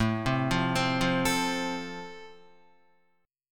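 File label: A Minor Sharp 5th, Guitar, chord strum; Am#5 arpeggiated; JGuitar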